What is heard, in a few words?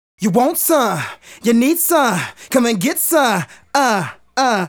Male Solo, Hip-Hop and RnB Vocals